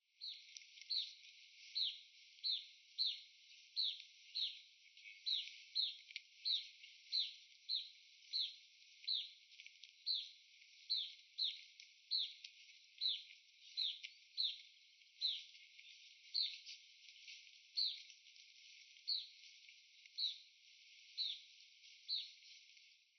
Huttons Vireo Songs

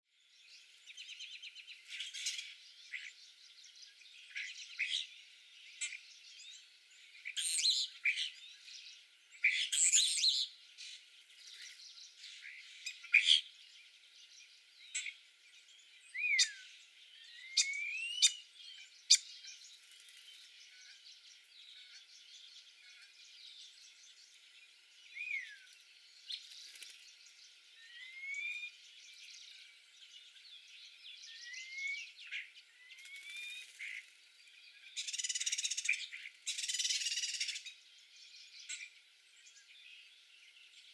European Starling from office window

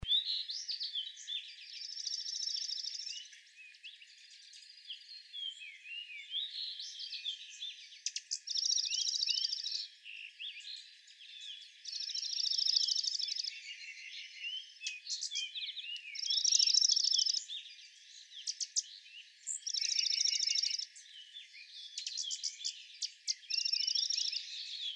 Junco

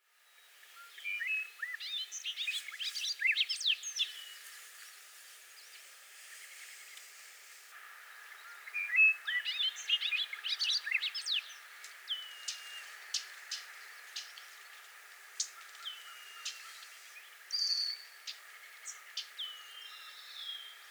Bobolink 4 Full Songs

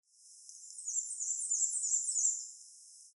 Black-and-white Warbler

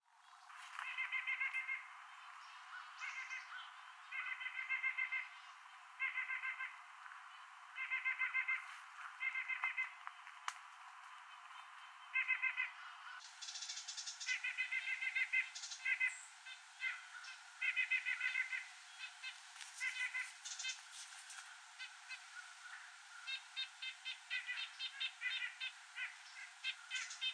Birds Scolding Saw-whet Owl

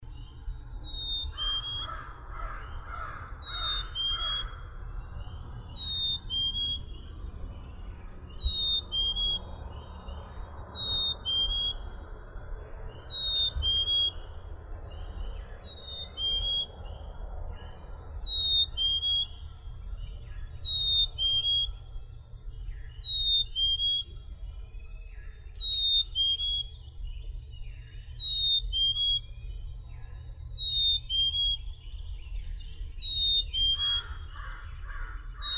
Black-capped Chickadee Noisy

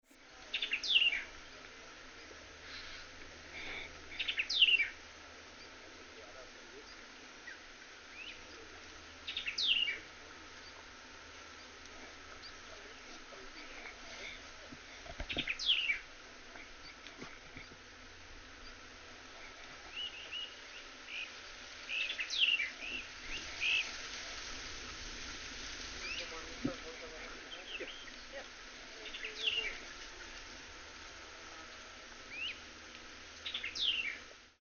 White-eyed Vireo songs Talking

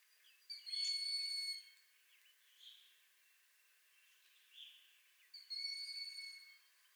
Broad-winged Hawk

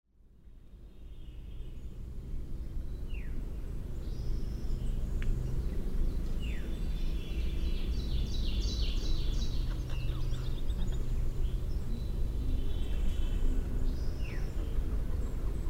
Ruby Throated Hummingbird

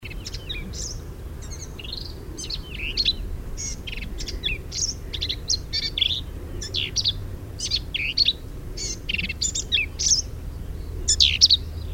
Black-capped Vireo

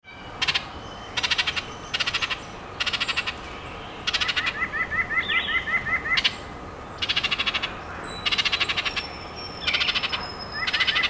Oriole Chatter

